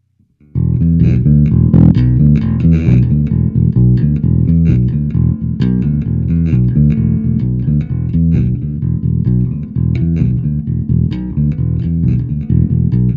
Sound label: Bass Line